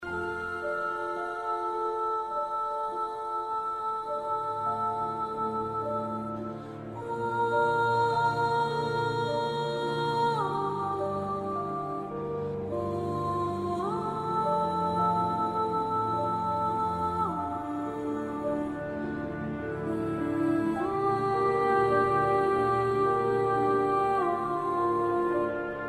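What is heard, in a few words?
angel effect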